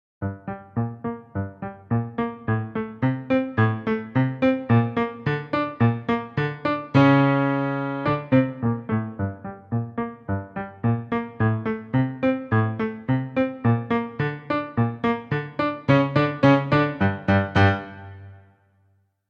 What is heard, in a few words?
Creepy Footsteps